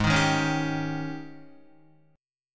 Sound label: G sharp Major 7th Suspended 4th, Guitar; G#M7sus4 strummed; JGuitar